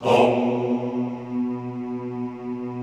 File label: HOMMM B1 R, Extended Classical Choir; Best Service